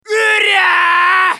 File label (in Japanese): 攻撃長; ソホ(格闘型)